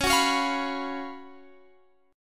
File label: Gb Major 7th Suspended 4th Db, guitar